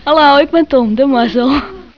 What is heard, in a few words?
tom